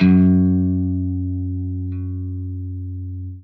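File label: jazz line, guitar; Quantum Leap - Guitar & Bass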